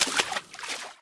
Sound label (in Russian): nebolshoj vsplesk vodi udarivshejsya ob kamen